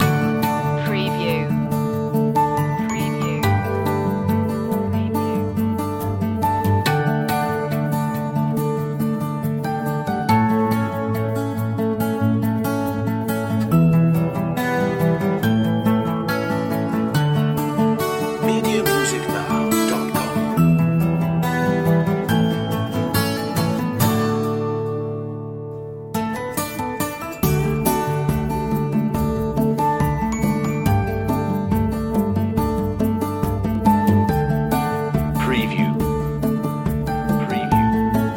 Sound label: earthbound loop